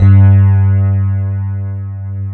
E-RHODES; Best Service Advanced Media Trax Modern Composer

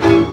VIOLIN HITS; Best Service; World Colours

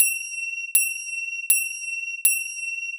Finger Cymbals; Sonic Foundry (Sony Creative Software); Joe Vitale Latin Percussion

